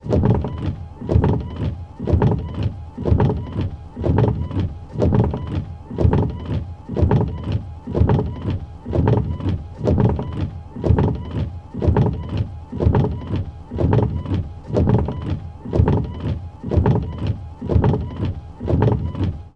van windsreen wipers on fast dry mercedes sprinter; transportation; sound effects one